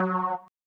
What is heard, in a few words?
175 D&B Synth F#m; Dance DJ